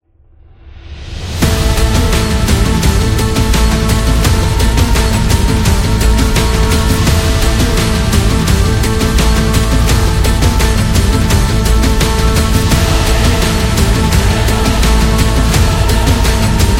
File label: Middle Eastern